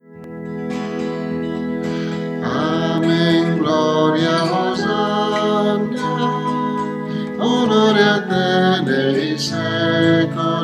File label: Amen